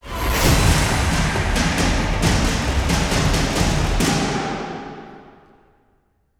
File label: Stinger Fill; extinction deluxe edition; ps4 windows xbox one